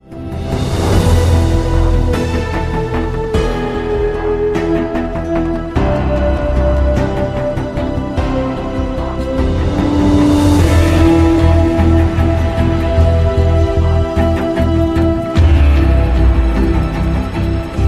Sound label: dark winds ringtone